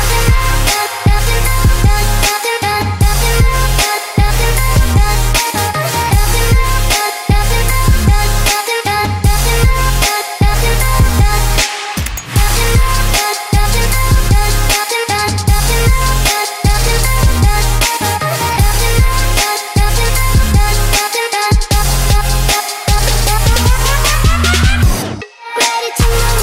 chillstep

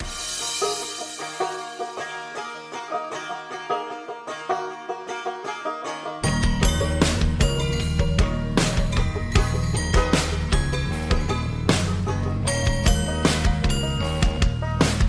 Ska

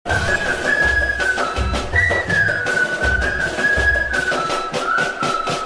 whistle

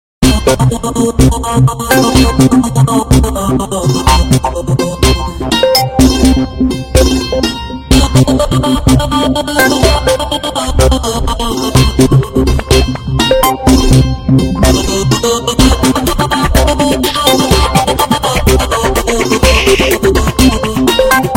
Nokia Goan adventure

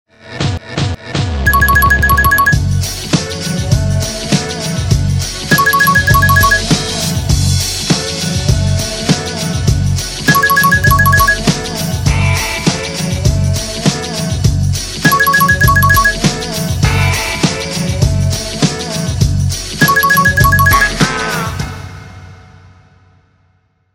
connected rock

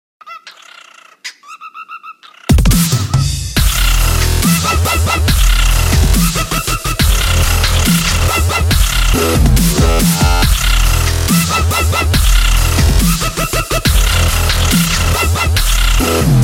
Dubstep Birdie

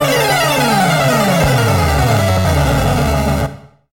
Bowser Falling; SMB3

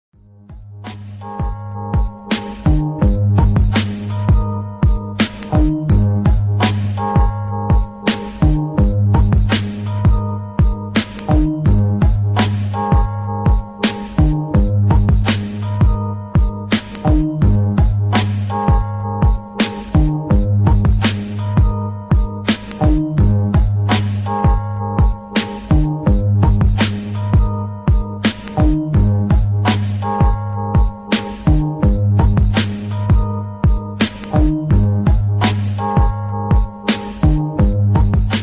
instrumental

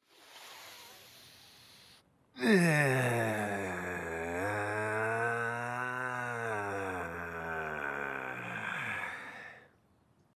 Sigh

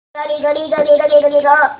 lil giggle

